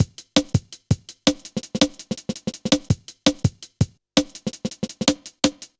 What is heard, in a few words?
Skippy, Drum Loops, Jungle; Zero-G Jungle Warfare 1, 2 & 3